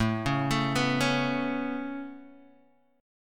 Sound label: A Major Add 9th A Guitar Open D, chord